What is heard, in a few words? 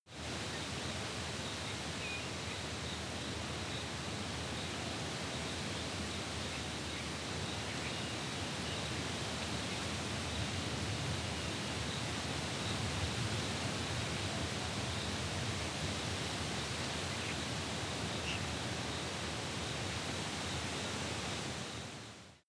africa winter windy animals